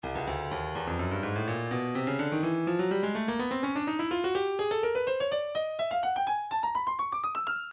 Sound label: breakbeat midi, 125 bpm; Cooked A; Stylus Breakbeat Midi Files